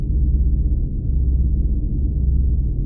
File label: Machine Ambient; timez attack